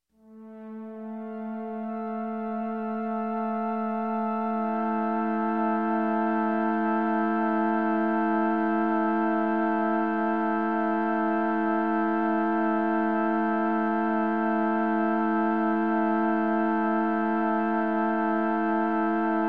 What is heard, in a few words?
Space Mystery Tune, Electronic, Music; Sound Ideas 2000 Series